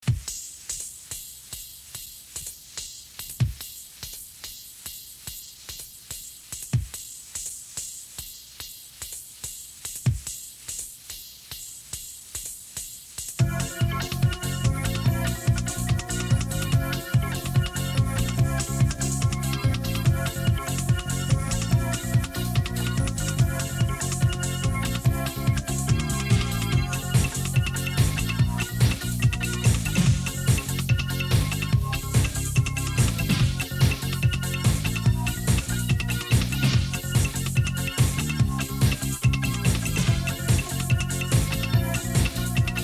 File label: street ska